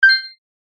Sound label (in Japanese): ピン